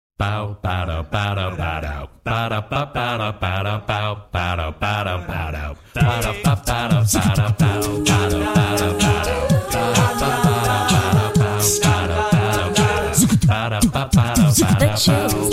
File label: groove; Bacchanal